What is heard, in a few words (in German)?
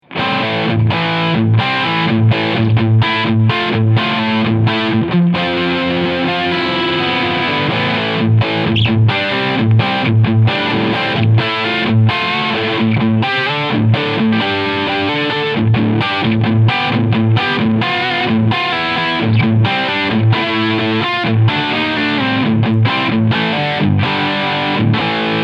Humbucker Pur Overdrive; Larry Carlton S7-3TS 2nd Gen